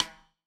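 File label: Snare Stick, drum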